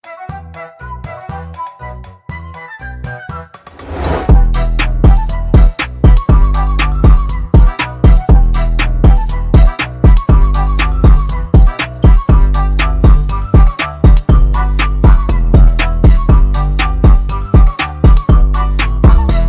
TRAP REMIX; android windows; Рандомная музыка в Кликере

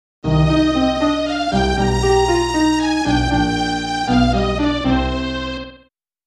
Spell Failed~Game Over

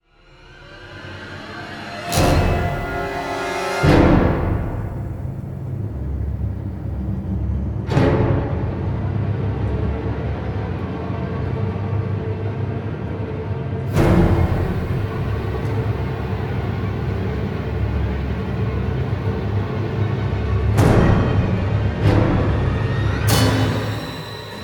stinger

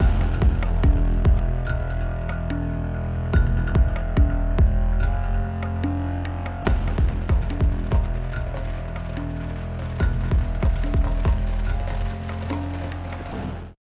Tension loop; Music Hideout2; fire disciple Tension2 loop

